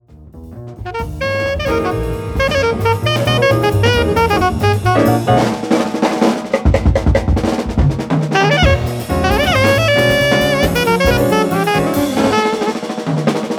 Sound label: jazz sax